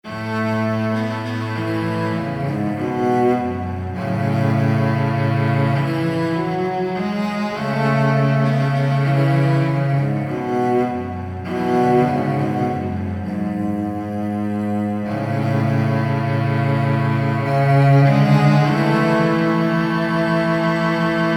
Cello Duet

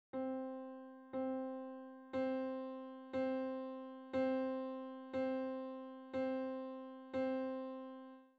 Quarter Notes in Adagio 60

